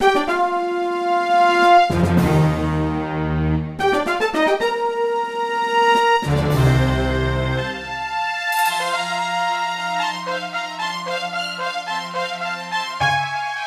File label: bird clear; Legend of Zelda: The Wind Waker HD; Wii U